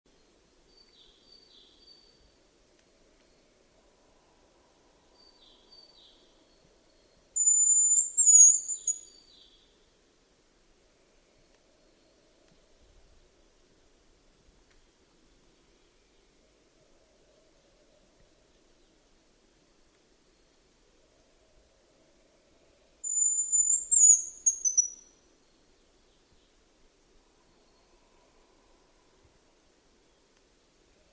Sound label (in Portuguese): Canto da galinha montes